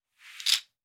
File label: casino bet